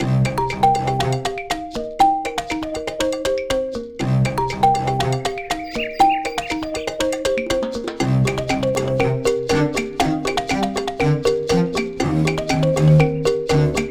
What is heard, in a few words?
CASUAL THEME 3 - LOOP, CASUAL MUSIC; FREE CASUAL MUSIC PACK; FruitMaster